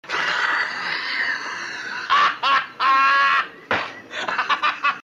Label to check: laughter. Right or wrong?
Right